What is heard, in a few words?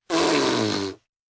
Wet Rasperry, 1 child, sticking tongue out, spitting; human voice; Attitude; kid, speak, talk